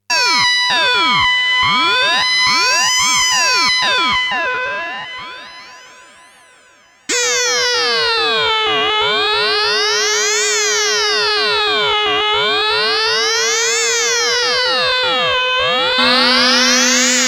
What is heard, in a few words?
sixtrak effects